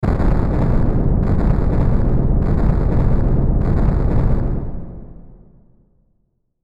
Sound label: Hit Rhythmic Reverb Crackle Bursts; Blastwave